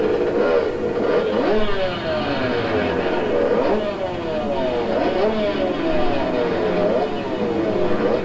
kx65 idle Rev

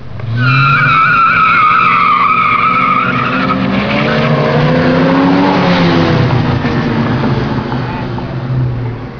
5.0 launch, mustang sounds; macstock

